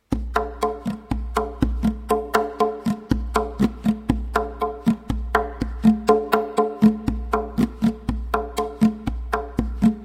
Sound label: tonbak; The right hand bak is substituted by a meyaneh.; now we substitute the last ton